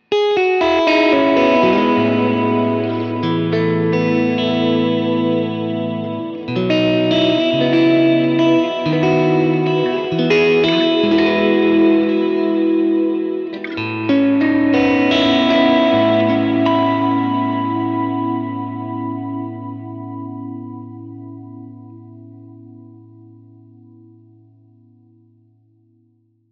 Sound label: Reverb